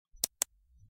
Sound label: hotwire move; hijack